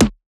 Perc, Percussion; FLPRO

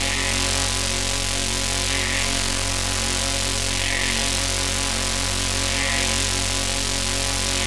Electric

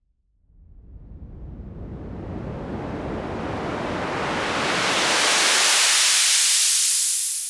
Raise Clean

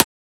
Transient; FLPRO

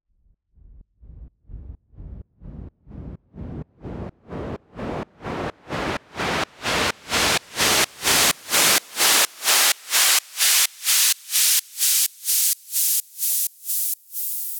Raise SideChained

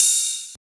Ride; FLPRO

